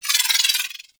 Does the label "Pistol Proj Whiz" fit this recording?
Yes